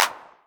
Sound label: Clap, Novation Drumstation